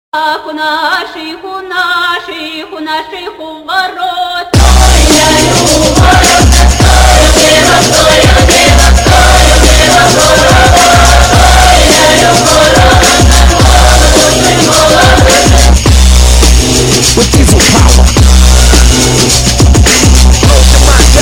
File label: Basssss gromky